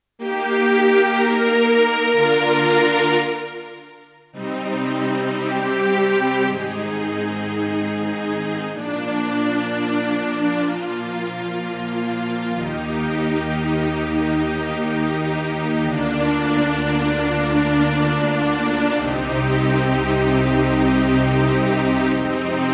Roland Go Keys Rich Strings

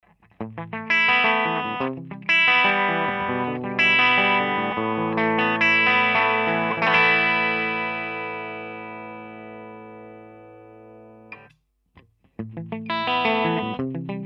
Clean SG2017

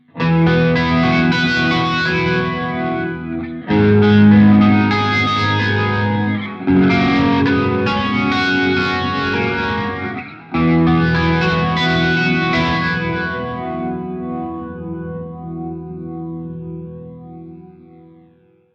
Rotary Octaver; Boss Katana Go

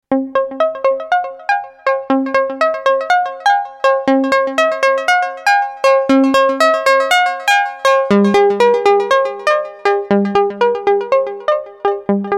Micromoog Sequencer

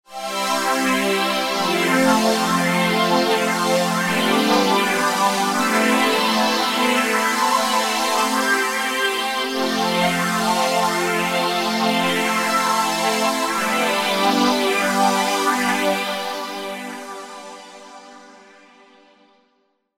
Korg Arp 2600 VST ARP Phased Strings

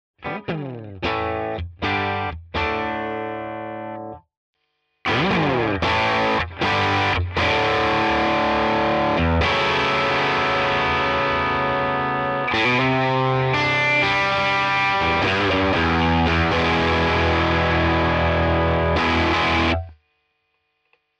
Walrus Fundamental DISTORTION

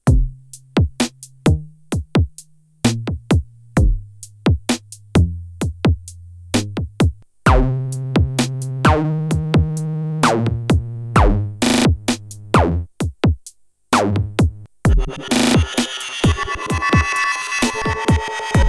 Polyend Tracker WTFM Engine

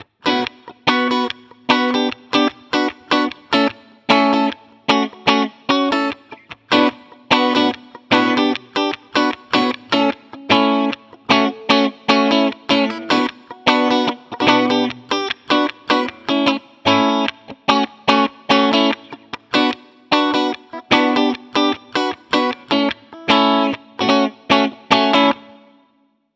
Custom 57 Clean 1x12 California Combo; Scuffham Amps S-Gear

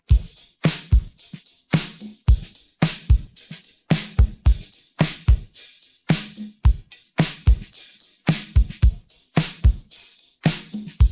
stems drums; logic pro 11

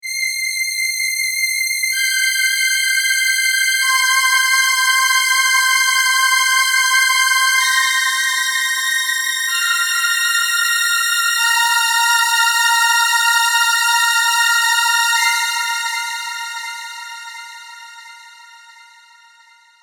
strings new aliasing free Osc

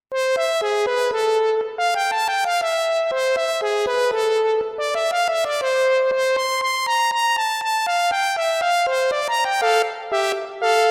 Korg Arp 2600 VST; Switched on ARP

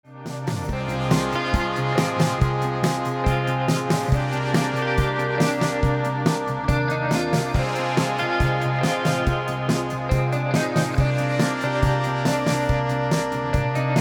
Krautock only Organic Amp

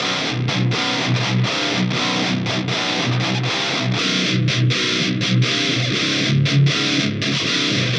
guitar scoop

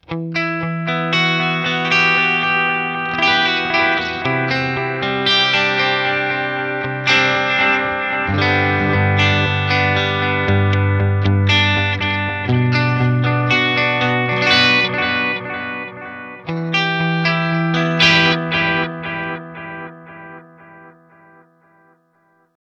pushed Variation Analog Delay Hall Reverb; Boss Katana Artist

